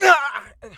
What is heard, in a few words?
Male Hurt; Mars Sortie